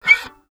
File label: Violin Extended Technique